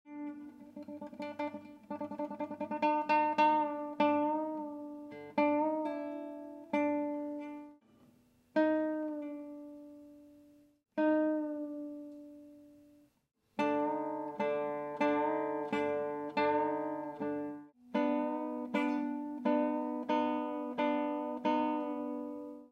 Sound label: Bends